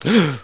startle, scientist

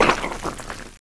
grapple release

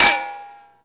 bullet impact

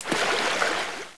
wade

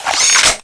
flak cannon switch

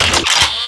flak cannon weapon pickup

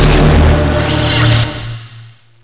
displacer self, weapons

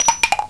shell, weapons

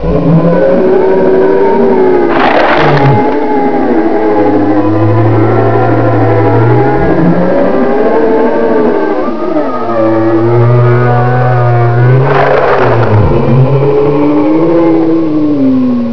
tentacle death

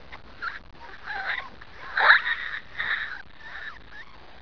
headcrab idle

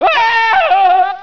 scream, scientist

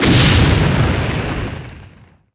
flak cannon core explosion

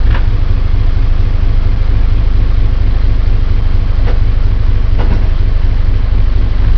tank idle

